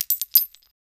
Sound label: arpg ui equip chainmail